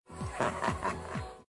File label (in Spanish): risa; raiden